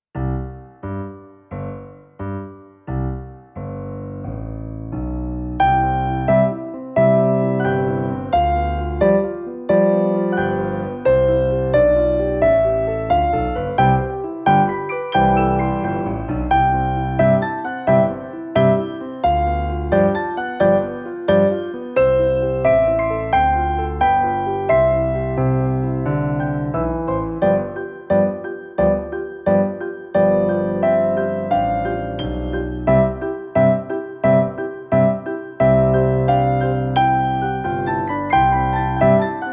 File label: Lightly Row Slow